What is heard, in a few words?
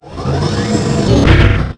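elevator up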